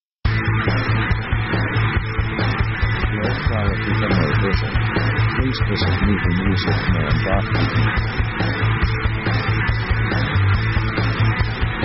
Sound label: pop 140bpm heavy metal